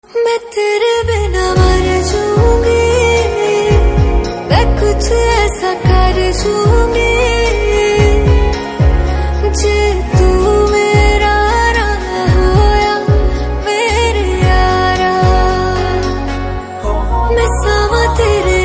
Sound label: Female Ringtone